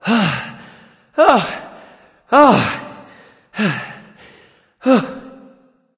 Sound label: nv breath; smite; voicepack; xbalanque